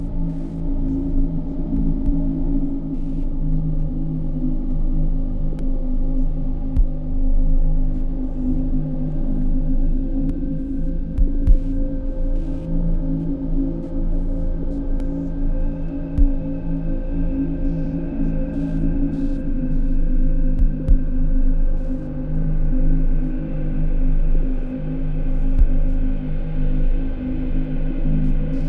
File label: Drone 01 Var1b (Looped); HellSign